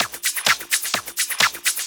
FX Loop 128 BPM, House

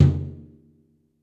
G2 Brush Tom 2, Yamaha PSR-E323 Brush Kit

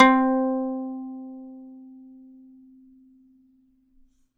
plucked Lyre C4; Polyend; Simulacrum Sounds; 1 Shots

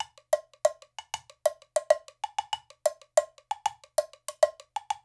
96, Percussionworks loops

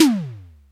TOM, Simmons SD-8 Drums

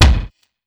SDS2000 BD, Simmons SDS2000; SDS2000 Factory7

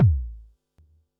Bassdrum, Pearl SC-40

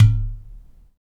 UDU, Supreme Beats - African Contem; Spectrasonics; SB Udus

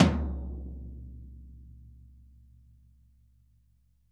VINT KITS; Sonic Reality - Vintage Timetraveler

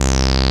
BASS, Analogon; A-BASS 2

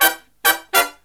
Brass Riffs; USB Soundscan vol.29; AKAI

